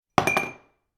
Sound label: glass drop and roll